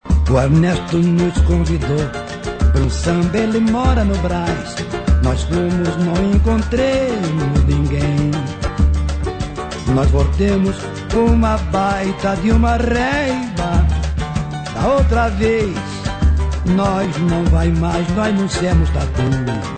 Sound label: Samba